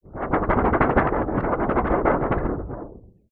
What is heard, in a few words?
foley paper laminated large sheet shake wobble